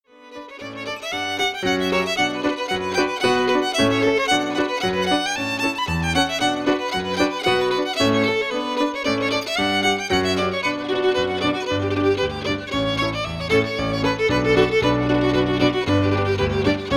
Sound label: Reels